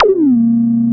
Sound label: TOM HI; Back In Time Records; Big Bang